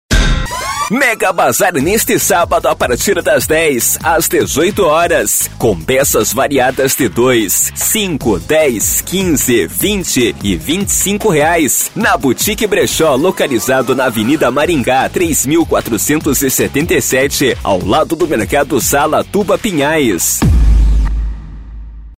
vinheta

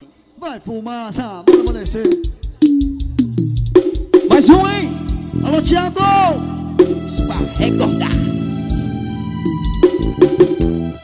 ao vivo medios graves; nailton cds

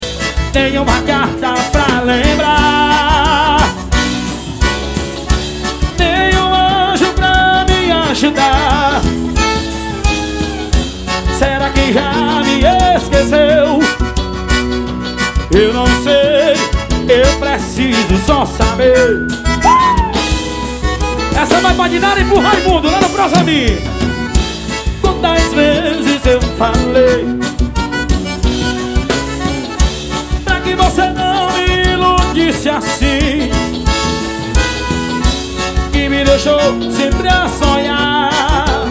dance balance forró

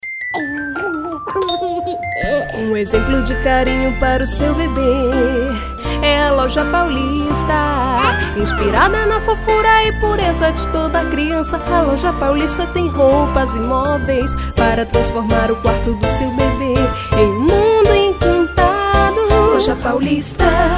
jingle loja paulista

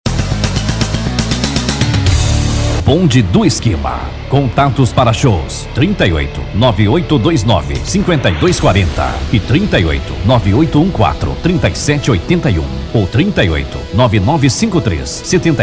vinheta; vinheta final

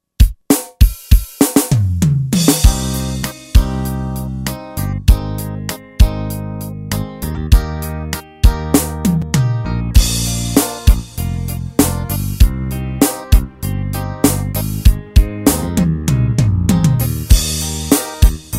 brega, ROLAND G70; RITMOS PROFISSIONAIS

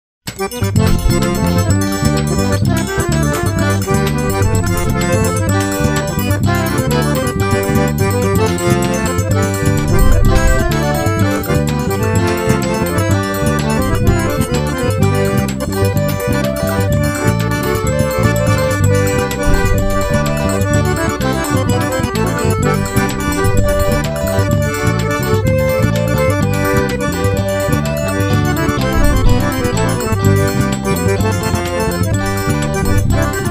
acordeon no forro

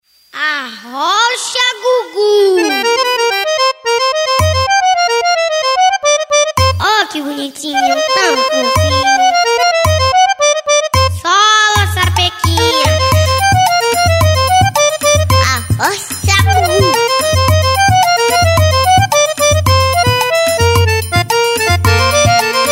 Forrozim instrumental

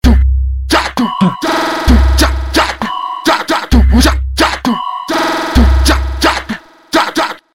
beat nova com grave mpc

